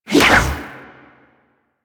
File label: Ball Throw Whoosh; Warframe; Missions; Tenno Ball